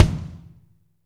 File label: KIK, Kicks; AMG; Now CD-ROM (Roland); NOW! Drums